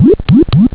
glug glug glug; ST-XX Amiga Soundtracker Sample Packs

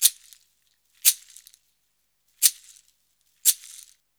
Modern Percussion Loops; Soundscan vol.56; AKAI

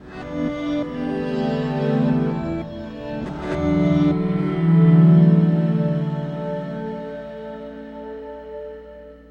Scree Song, Acoustic Drone; Sonic Foundry (Sony Creative Software) - Friendly Fire; DroneLoop

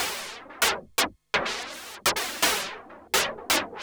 Juno-60 Arp 125BPM

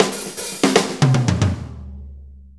6-8 Fill, Drum Tools

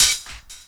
HH, Dance Mega Drums; Best Service; HH HOU

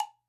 GJ PERC, Percussionworks; Sampleheads; NYC Percussionworks; GJ PERC VOL1

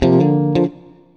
STRAT GT; Synclavier Sampler Library; KEYS AND GUITARS

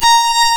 Synclav FM STRINGS A#; Big Fish Audio

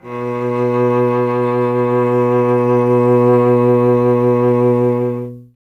STR cello B, Cello; Miroslav Vitous; Solo Instruments VOL-1

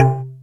native perc; Greytsounds; Scott Peers Sound Engineering

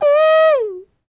MMM, Vocal Blues Soul; Spectrasonics; Planet

